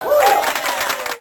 high Score; shweets sim; snivy

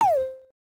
score Loss; shweets sim; snivy

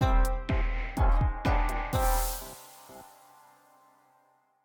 wave Complete; calamity-cobra-2

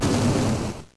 explode; shweets sim; snivy

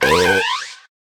burp; shweets sim; snivy

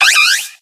cry; shweets sim; snivy

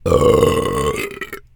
burp; shweets sim; braixen

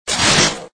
hurt; shweets sim

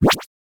summon; shweets sim; braixen

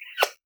kiss; shweets sim; snivy

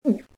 muffled; shweets sim; snivy

